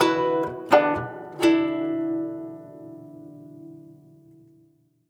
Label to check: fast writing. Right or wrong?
Wrong